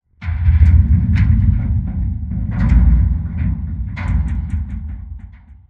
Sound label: dish crane move start metal stress; Titanfall 2